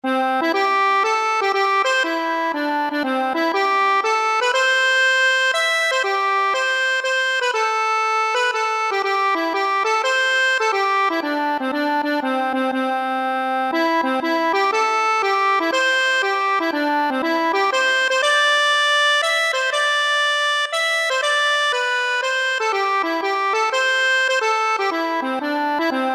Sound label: accordion